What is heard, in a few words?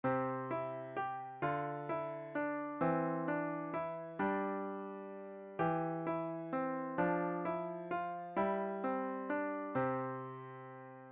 Coordination, piano exercises